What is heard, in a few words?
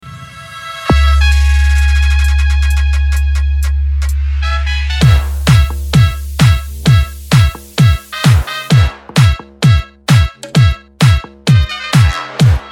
bluster alto falantes especial bass